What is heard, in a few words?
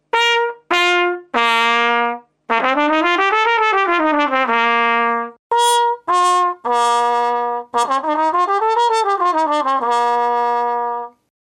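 trumpet mute cup mute copper; faxx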